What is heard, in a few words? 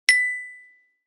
ticket buy